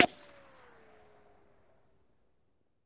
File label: Bullet Ricochet Sin